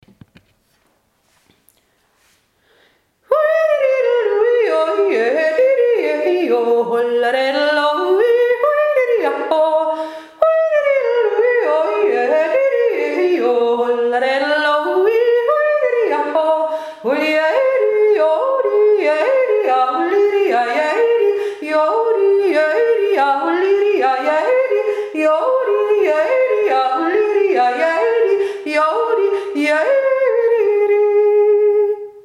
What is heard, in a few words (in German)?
tirolerjodler schneller